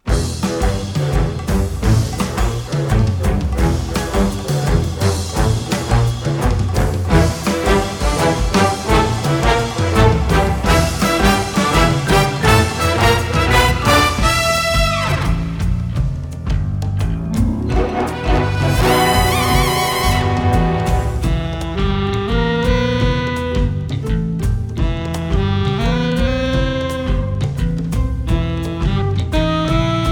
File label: Ingame Orchestra Big Band